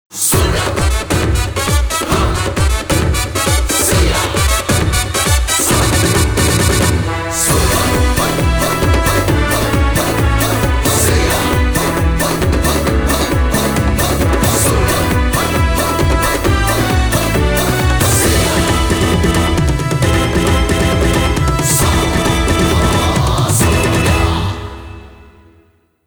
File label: Jingle Staffroll; Taiko no Tatsujin Blue Version; System 357; PS3